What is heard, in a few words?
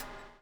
BUBBLE; Spectrasonics - Backbeat